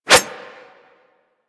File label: Claw Swipe; Manic; Attack